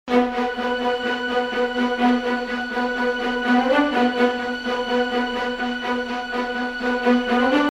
synths ostinato synth, synth blends with the strings; epic orchestral track